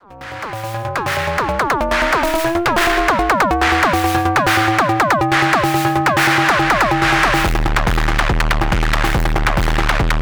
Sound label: Hard Distortion